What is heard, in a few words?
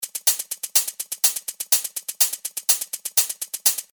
HiHats (Fm 124 BPM)